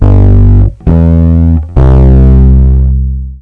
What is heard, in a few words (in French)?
timbr